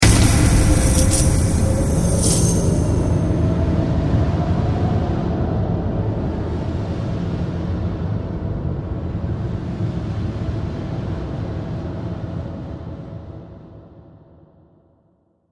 Horror Hit Chains Ghostly Reverb Long; Hits; Production Element

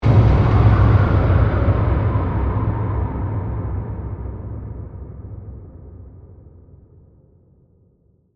Hit Ghostly; Hits; Sound Design; BlastWave

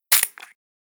Water Squirter Sound; Spraying Sound Effects; Foley Sound Effect; Andrew Fleming

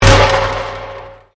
Convolution Pulse

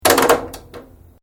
Switch Washing Machine Turn Multi Click; Various switches; Appliances; Household

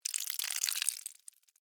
Blood Spill Drip, Horror, Blood, Spill, Drip; Blood and Guts; BlastWave

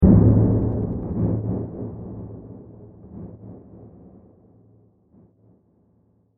Diffuse Impact With Delays; Blasts; Impacts; Crafted Signals

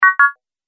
Multimedia Completed, beep; Andrew Fleming

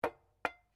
SLEDGEHAMMER METAL IMPACT LIGHT; Working with a sledgehammer; Hammers; Tools

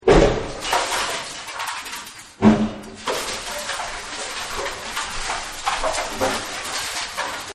Raining Debris; Sound Effects:Materials; Partners In Rhyme

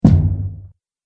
Impact Dry; Miscellaneous Impact Sound Effects

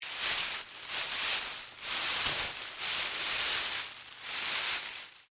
Impact Foliage, Movement, Leaves; Rustling (Sound Effects:Objects); BlastWave

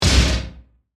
Hit Processed, Metallic, Stamp; Production, Element, Imaging, Accent, Transition

